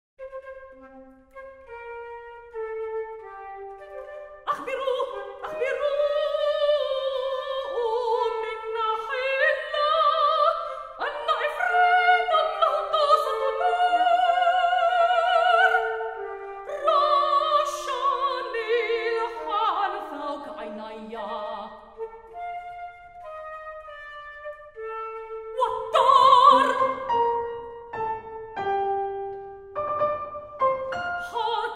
Eyelids, version for soprano, flute and piano; sung in Arabic